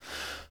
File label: BREATH; Northstar - New Gold VOL-2